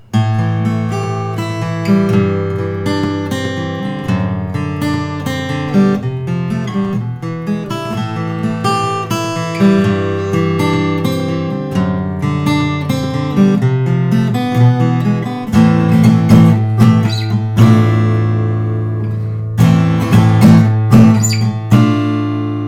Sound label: martin custom 00-42sc natural, cocobolo, guitar